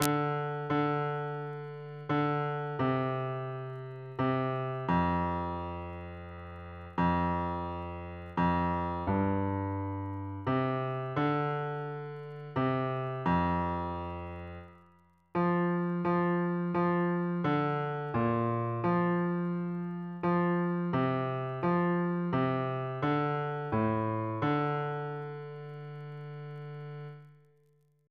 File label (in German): bass; Blaeser; Advent